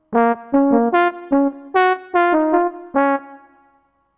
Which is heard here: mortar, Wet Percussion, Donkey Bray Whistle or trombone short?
trombone short